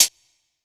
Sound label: Hihat; Chillin Out; Sonic Foundry (Sony Creative Software); Stylus Pressure Urban Grooves on Digital Wax